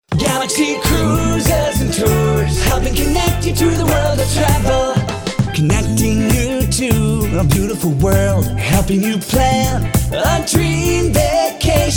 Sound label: Full Sing Jingle; Galax SEA Cruises Tours